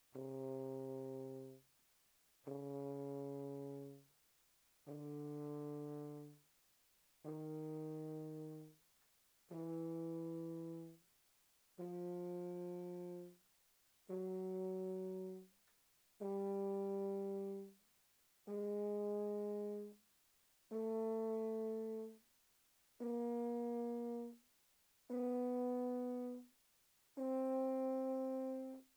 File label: Tuba mf C3 C4